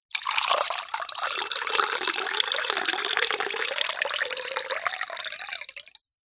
food drink water pour into small drinking glass slowly